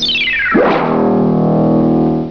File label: complete